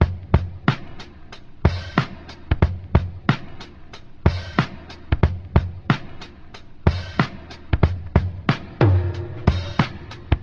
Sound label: sudden tom, Boombap Drum loops